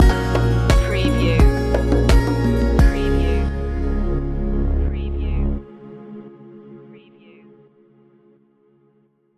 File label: punch your ticket stinger